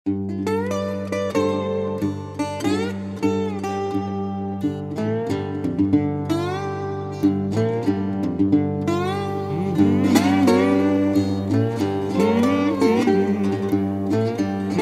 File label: blues